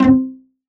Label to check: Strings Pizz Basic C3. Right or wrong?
Right